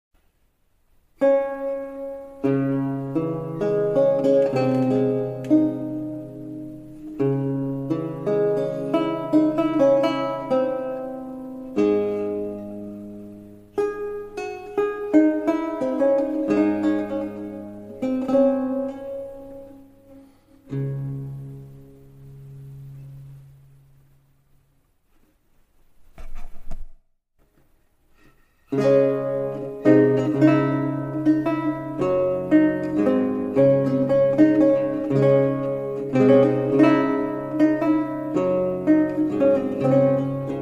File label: prelude menuet